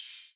Ride

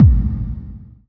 Bass Kick Reverb

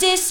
vocal

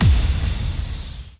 Impacted

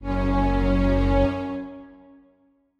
Strings

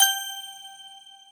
bell G#6

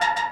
synth; osu

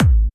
soft hit whistle

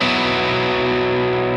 Arp. Bsus4

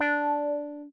D5 meow

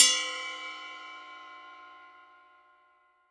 ride bell

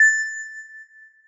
Bell A7

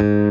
bass

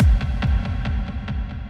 Kick Repeat

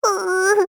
combo break; osu

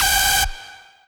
lead

